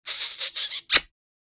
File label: Comedy kiss goofy smooch; shorts sound effects